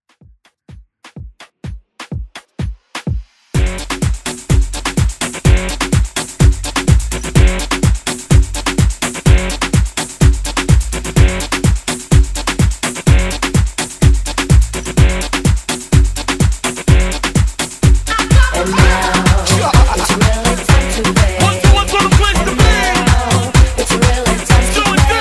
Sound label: Throwback Redrum